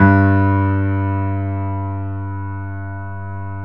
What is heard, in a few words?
PIA GRND, Roland